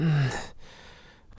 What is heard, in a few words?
Moan